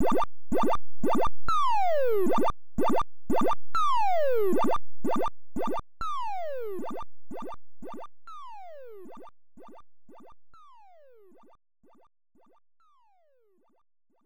BGM; donkey kong; nes; 75M (Stage 3)

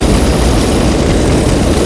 FLAMETHROWER; blockade classic

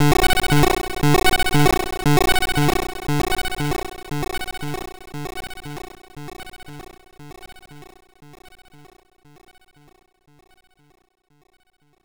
Warning; Battle Unit Zeoth; gb

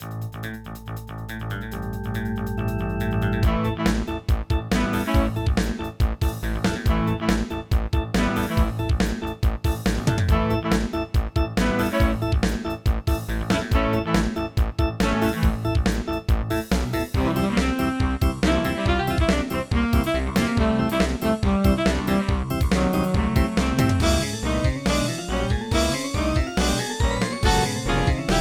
bgm battle normal; beastie bay android game music

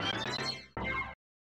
Clear Level; Clock Works; SNES

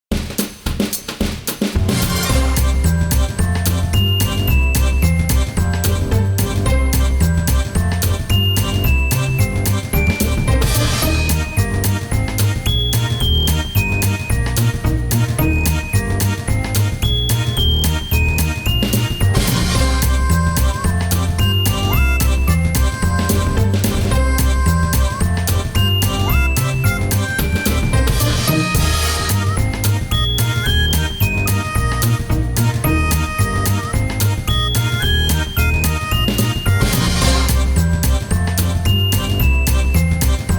Conversation; We Ski

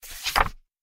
Turn Page

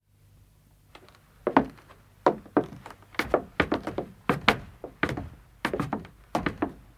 Ladder steps; The 39 Steps

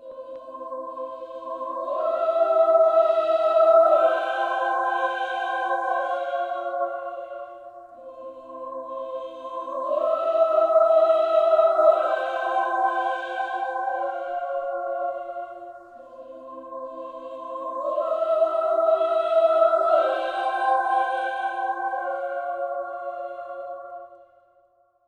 Choir; Hitman Blood Money

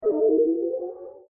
Teleport; yet another pixel dungeon android

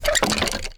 flat Fall Big; Toy Story Mania; iOS gamerip

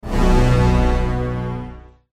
Game Failure

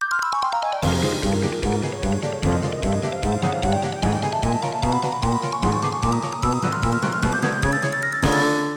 Music Chase

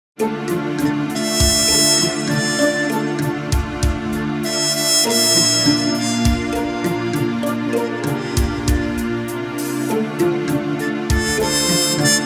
instrumental; City Hunter The Secret Service

